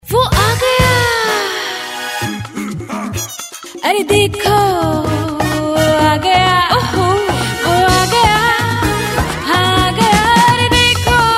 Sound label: Tutti Frutti TV ad dekho wo aa gaya song